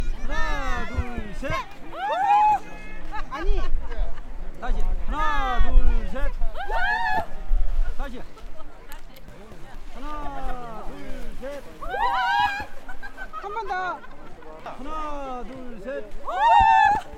사람들; beach

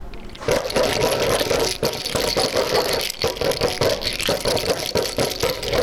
보글보글

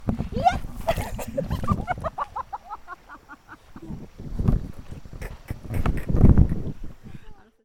웃음; beach

